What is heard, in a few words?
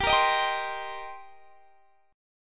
D sharp 6th, G